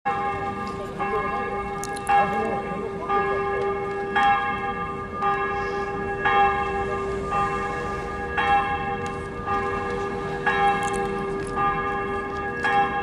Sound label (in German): Glocken von katharien